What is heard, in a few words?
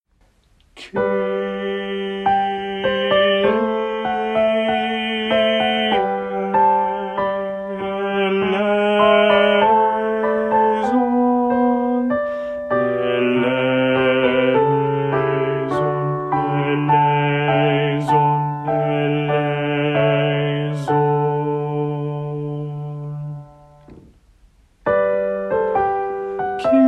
Kyrie Bass